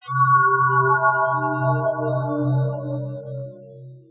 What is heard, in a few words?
sound bell